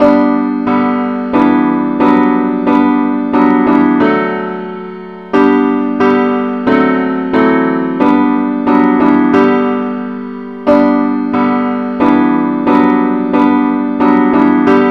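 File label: advance accompaniment key g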